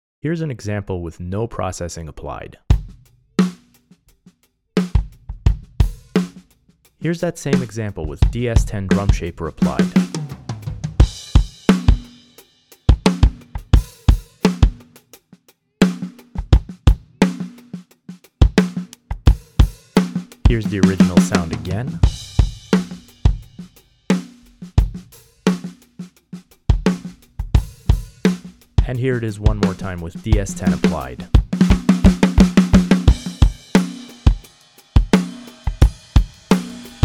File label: live drums